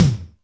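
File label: Wet Hex, Alesis D4 drum machine tom; 300 Drum Machines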